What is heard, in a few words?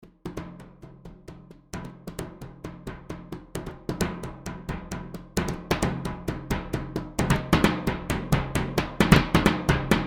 Multi Barrels Large Velocity